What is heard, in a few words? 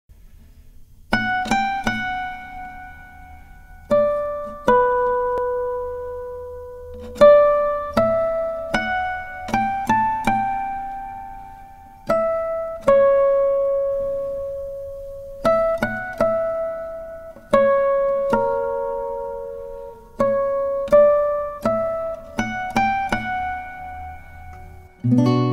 ringtone; karkheh